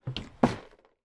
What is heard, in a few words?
under bed storage tray slide out, household